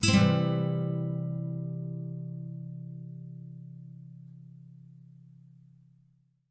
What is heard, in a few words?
Nylon Chord C maj, nylon guitar chords